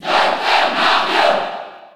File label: Cheer French; Dr. Mario; SSB4